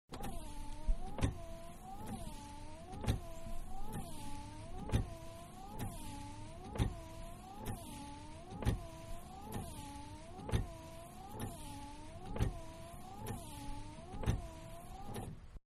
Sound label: Car Small-Windshield Wiper-Slow, Exterior; Vehicles; Manhattan Production Music